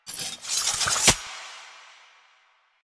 Idle Sword Twirl; Arthur Hub; Warframe; NineteenNinetyNine

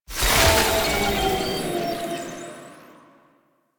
Enemy Use Hindrance; Warframe; Nineteeen Ninety Nine; PvPvE

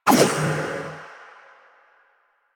ball throw foley; Warframe; Stalker

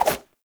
Run Whoosh, Foley, Footsteps; Enemies; Warframe; Lasrian Soldiers; Lasrian Butcher

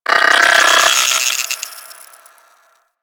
Space Drone B Attack B; Warframe; Corpus Space Drone

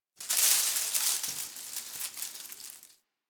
Derelict Tree Shake; Warframe; Orokin Derelict

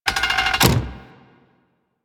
Rolling Drone Turret Turn

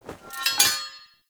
Armour Fragment Pickup; Objects; Pickups

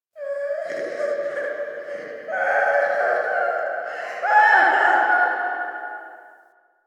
Dungeon Island Misc Children Ambience; Warframe; Duviri

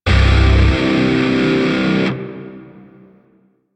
Shawzin Yo Chord D; Music; Grineer; DE; Warframe

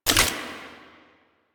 Shotgun Reload; Weapons; Warframe; Pax Duviricus

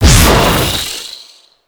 Rifle Empty Fire Explosion; DE; Warframe; Weapons; Sentient; Sent Rifle New War

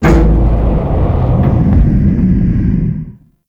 Glass Sphere Void Shake; Warframe; Ambience; Entrati

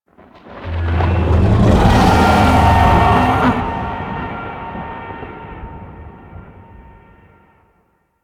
Void Dragon Vocal Distant Idle; Warframe; Duviri

